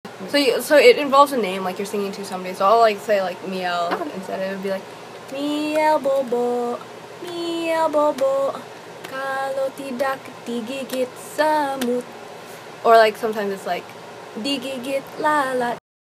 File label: Indonesian Lullaby